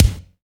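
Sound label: bass drum; FX+MIX; REMIX 2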